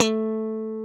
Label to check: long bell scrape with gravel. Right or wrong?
Wrong